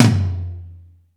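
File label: GATE TOM; Crypton - DrumBase5000; GATE KITS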